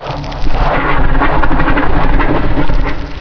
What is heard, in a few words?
alien door; doors; Source Engine; bshift